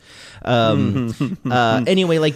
interesting laugh

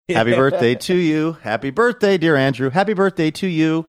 singing happy birthday

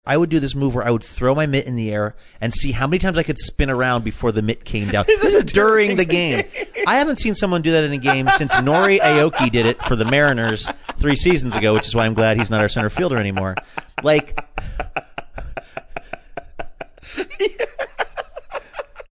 cracks up laughing

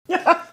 chuckle

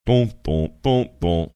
singing four notes

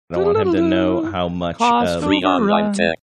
whistle

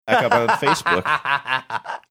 laughing

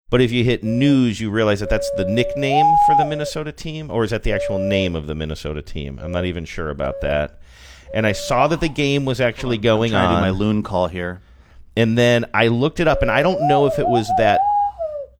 making loon call sounds while talking

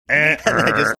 mimicking uh oh drop

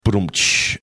making rimshot sound

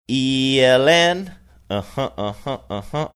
singing el nuh huh uh huh uh huh

